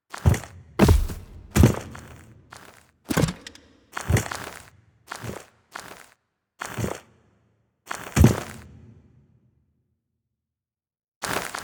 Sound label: Foley A; Cinematics; Finale